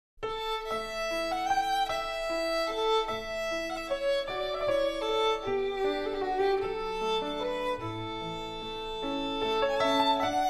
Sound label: Traditional Intro Instrumental